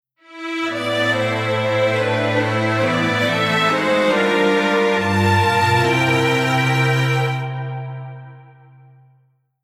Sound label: Strings Poly Hamo Reharmo